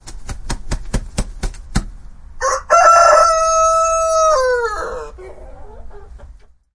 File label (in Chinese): 公鸡叫声-咕咕咕-公鸡打鸣